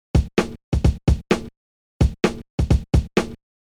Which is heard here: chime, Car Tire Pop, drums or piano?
drums